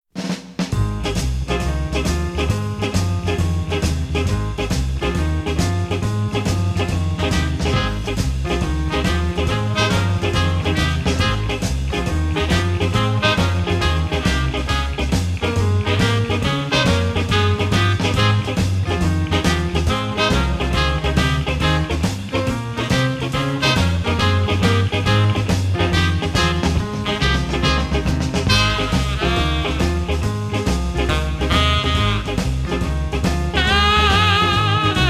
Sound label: Shuffle